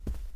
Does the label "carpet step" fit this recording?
Yes